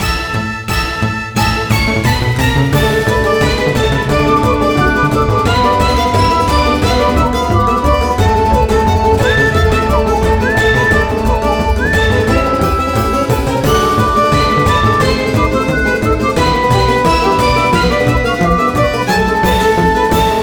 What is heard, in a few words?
Coin Bonus